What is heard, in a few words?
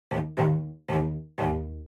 Cello